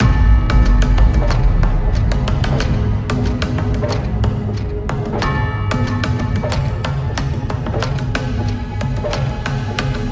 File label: Epic Bells Running Flat